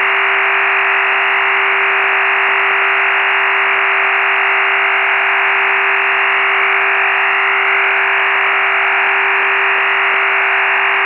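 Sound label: BUL39 traffic; BUL 39-tone modem in tfc. condition; BUL-DIPLO 39-TONE MODEM; PSK-systems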